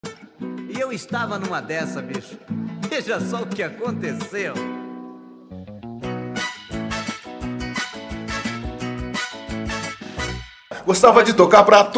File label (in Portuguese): vinheta cantada sanfona